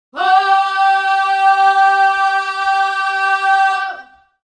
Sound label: african vocals male oh